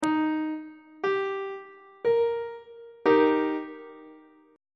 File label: e flat major chord on treble clef